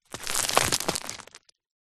mud dried clumps small fall roll hill break crumble landslide; zapsplat; foley